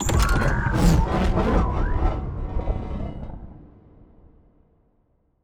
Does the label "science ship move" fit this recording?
Yes